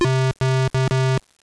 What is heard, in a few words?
Death